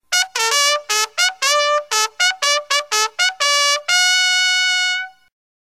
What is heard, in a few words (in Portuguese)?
reunir